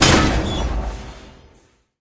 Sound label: Platform break